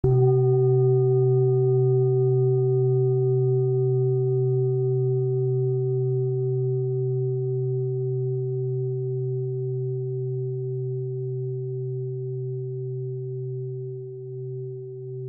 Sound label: Tibetan Singing Bowl 256 Hz Deep Resonance; Root Chakra